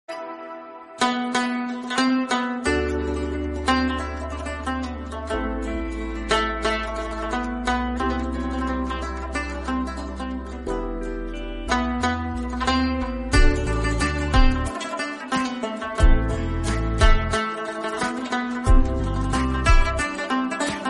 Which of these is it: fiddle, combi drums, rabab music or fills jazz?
rabab music